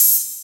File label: HH, Dance Drums